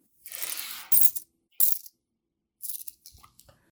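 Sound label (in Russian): монеты смахивают со стола в руку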